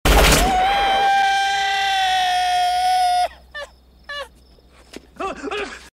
stab; dirty harry

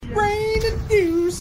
raining

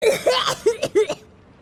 cough; paimon

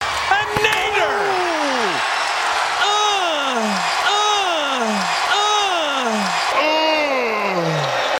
ughhh

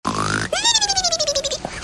cartoon snore

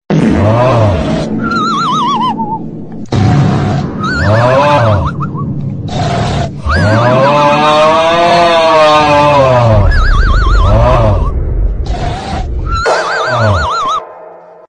tiktok meme snore aaauugghh dream version

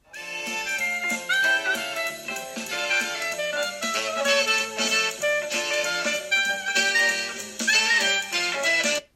jazzy intro

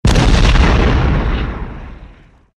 impact explosion

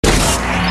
pick up; minikit; lego star wars

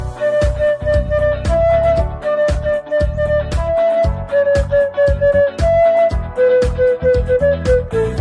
flute cover

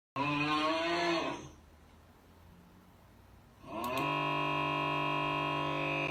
augh

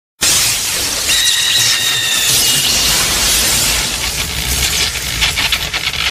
chidori sound effect

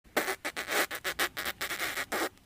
mouth static

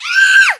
woman shrill scream funny

